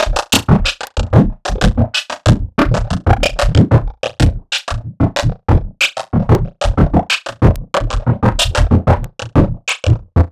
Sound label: Dirt Bublz, Drum; Sonic Foundry (Sony Creative Software) - Future Retro Dance Excursions